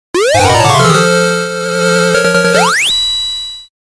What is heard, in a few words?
sound effect new present; danganronpa